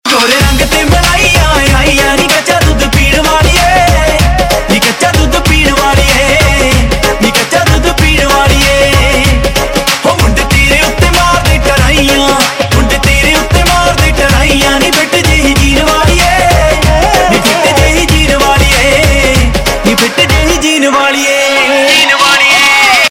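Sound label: Ringtone, Punjabi Pop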